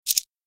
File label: button; jumping